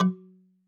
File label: marimba kalimba